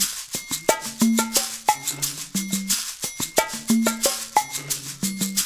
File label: LOOP, Percussion; InVision Interactive; Percussion Slam; ZOMBIE